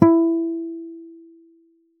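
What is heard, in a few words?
pizzicato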